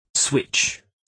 switch

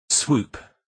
swoop

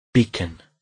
beacon

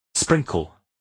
sprinkle

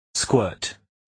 squirt

